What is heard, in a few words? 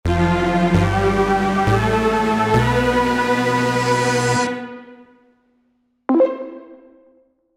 winning, music; micromayhem